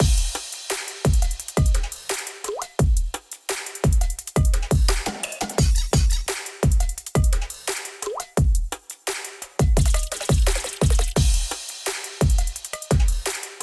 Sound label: drum loop full